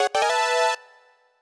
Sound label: Jingle Microgame Success